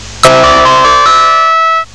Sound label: TINGLE